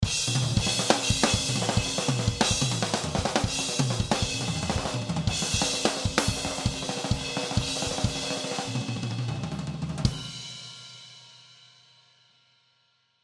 Drum Solo Short; Drum Kits; Blastwave

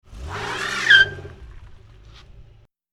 Car peelout Ice, Car Peel Out On Ice; Car Wheel Spins On Ice And Squeaks; Cars; Vehicles

